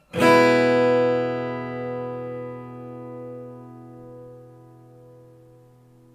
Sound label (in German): Klang